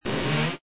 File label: chest open